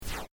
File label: Beep Electronic Slash; User interface feedback sounds; Beeps; Multimedia; SoundEffects-Foundation1